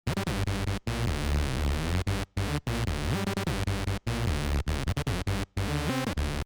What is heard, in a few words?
notch lead